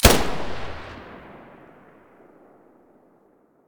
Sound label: muzzle vector stereo, weapons